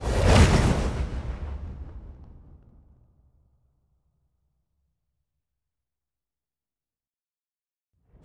science ship move; aquatics

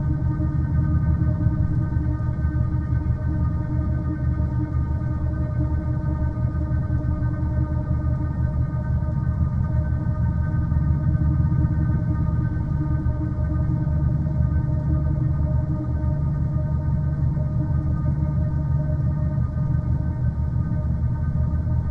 megastructure gate way station hum; apocalypse

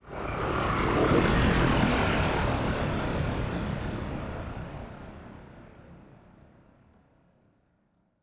ship titan move; Stellaris; machineage

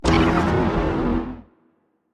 confuser laser hit, weapons; biogenesis

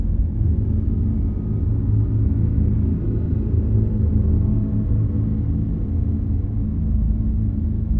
ancient drone idle; Stellaris; other entities

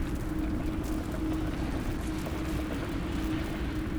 hive minion idle; Stellaris; guardians

